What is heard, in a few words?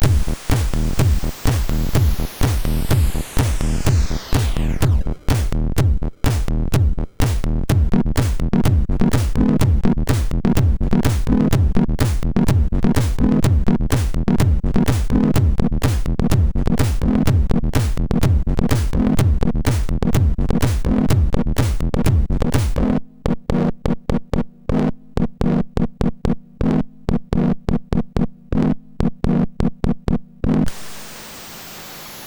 sid, MOS6581; hvsc